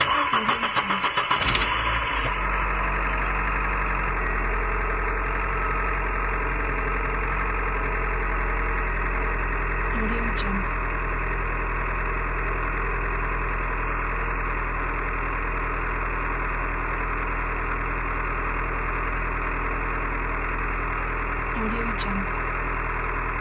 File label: car starting